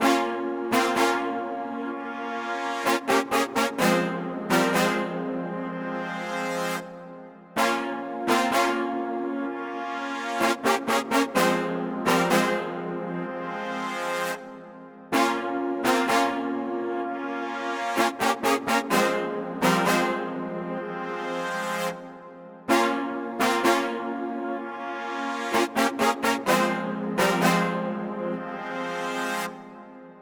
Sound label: 127 BPM F Min Brass; Trap Melody Loops; Loop Stems; Cymatics; Nightmares; Major